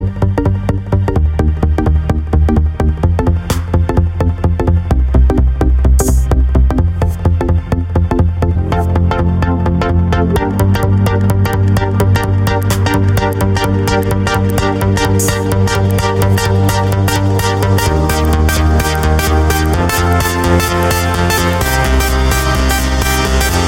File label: melody, ringtones classical